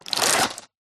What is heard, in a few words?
household medicine tables blister pack large remove from box others